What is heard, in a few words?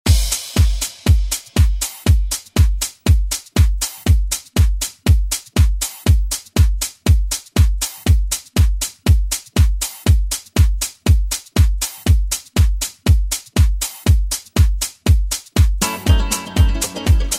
Medley 90s; DJ Mhark; ReDrum